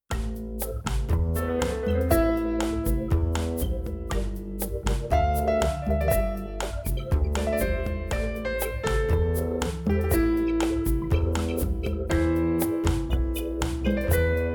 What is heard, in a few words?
Bossa Nova 6 Channel; WMA Surround Mixes